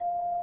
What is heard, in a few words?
tone loud